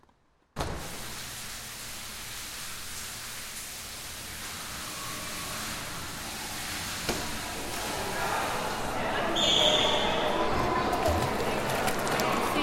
Training Session In The Sport Pool

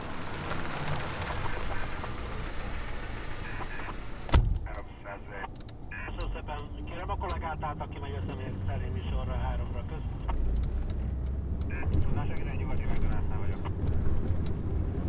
Taxi At Night

